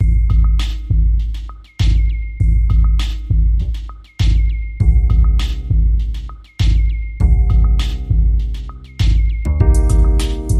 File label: Smokey Piano